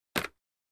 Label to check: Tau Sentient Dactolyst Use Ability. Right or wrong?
Wrong